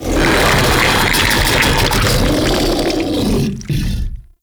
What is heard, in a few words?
Ancient Tank Attack, Enemies, Infested, Barks; Warframe